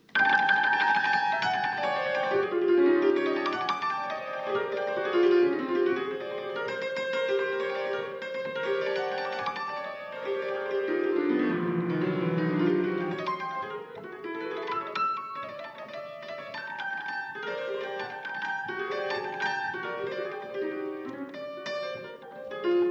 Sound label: Prelude Piano LIVE